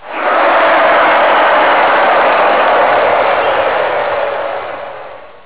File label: win